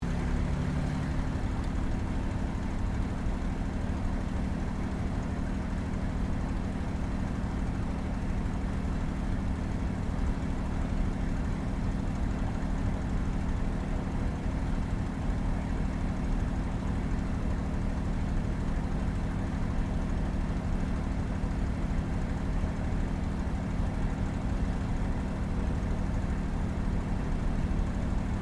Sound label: AIRCRAFT CAC CA-18 MUSTANG MK23 TAXI SHUTDOWN INTERIOR; CA-18 /P-51 Mustang; Airplanes; Vehicles; Aircraft Collection; Twistedtracks